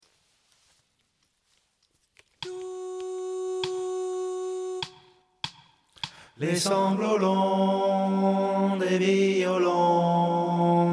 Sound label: alto, chorale; verlaine